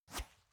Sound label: woosh; Ion Shift